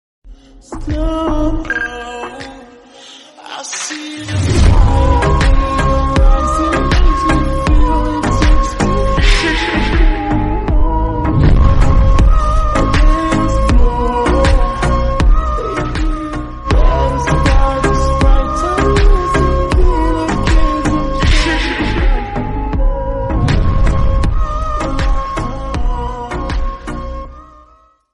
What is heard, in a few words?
slowed remix reverb bgm ringtone